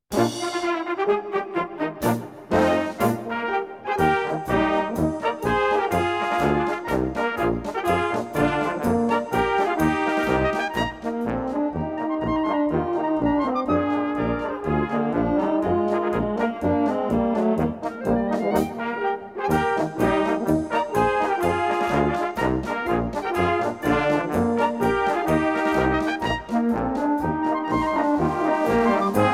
Chisetaler Marsch

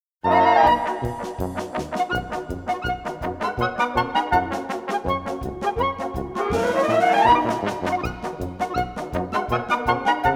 Tritsch Tratsch Polka

